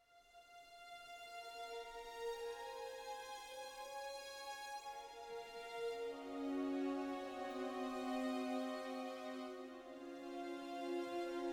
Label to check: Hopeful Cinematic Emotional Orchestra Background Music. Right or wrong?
Right